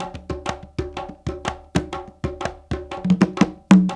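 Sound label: Bembe Latin 6 8; Spectrasonics - Supreme Beats - World Dance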